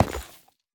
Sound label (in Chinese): 下界矿石踩踏声